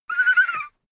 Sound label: child laugh fake high